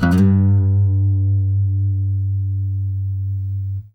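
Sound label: trill, Guitar; Quantum Leap; Guitar & Bass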